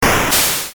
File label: retro; maoudamashii